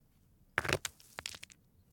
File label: Rock Quick Drag On Rock; Rocks